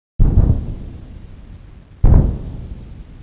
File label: Sonic Boom